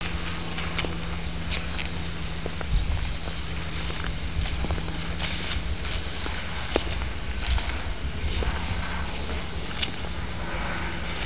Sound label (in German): schritte flugzeug; krampnitz; Handshake-Feldreise